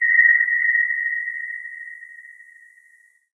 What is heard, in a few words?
amb rand abstract hi; source engine; portal2